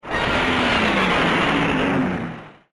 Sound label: roar; Grotogauros